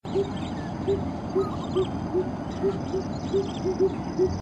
Lesser Coucal call; Amar

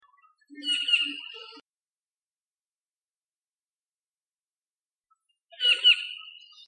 Juvenile Oriole Call

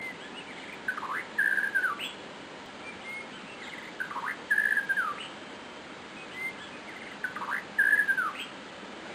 White rumped Shama male call; Amar

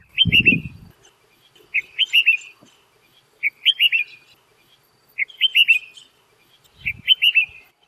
Red-whiskered Bulbul calls; Ipoh City Perak Malaysia

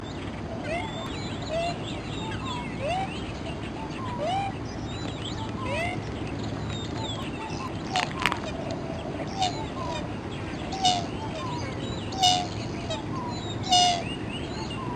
Purple Swamphen calls

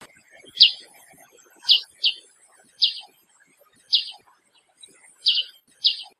Black headed Bulbul calls; Amar

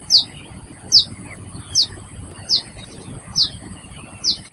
Common Tailor Bird calls juvenile

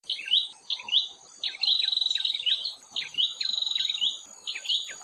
Ashy Tailorbird calls

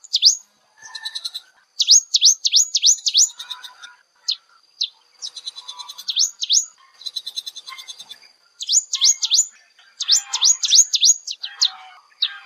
Rufescent Prinia calls Ipoh Perak Malaysia

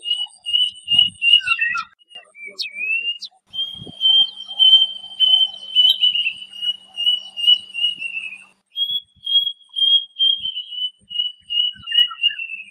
Plaintive Cuckoo calls Ipoh Perak Malaysia

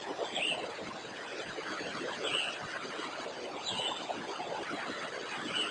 Blue winged Leafbird call; Amar

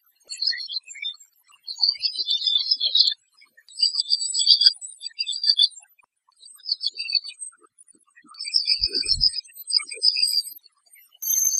Arctic Warbler group calls

